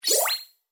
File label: equip